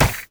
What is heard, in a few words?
crit attack; LoL; badrobotamumu